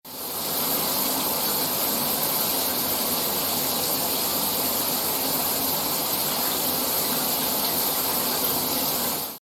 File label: shower